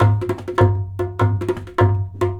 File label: DHOL, Indian Traditions; USB Soundscan vol.45; AKAI